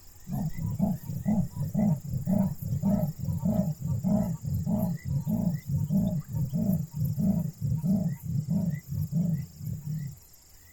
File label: Leopard